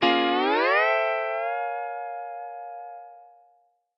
Retry Jingle; Friday Night Funkin Funkin for Bikini Bottom ost windows gamerip 2022

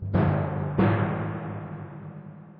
Tymp

